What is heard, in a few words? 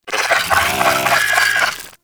Vocal Idle; Warframe; Moa Satyr